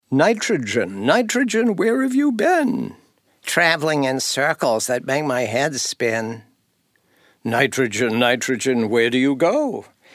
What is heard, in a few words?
Nitrogen Element Jingles